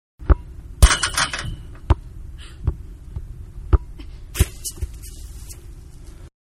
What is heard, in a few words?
Soccer Ball hitting goal post, Soccer-Ball Hitting Goal Post, Then Kicked Into Net; Soccer Sound Effects; Manhattan Production Music